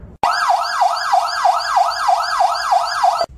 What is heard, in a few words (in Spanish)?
sonido ambulancia